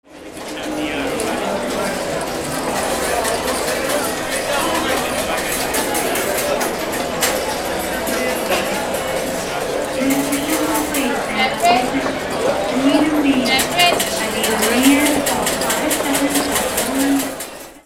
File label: CASINO